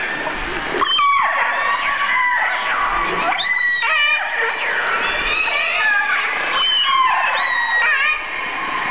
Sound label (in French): Pod d orques hauturieres